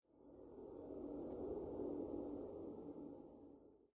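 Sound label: Background Noise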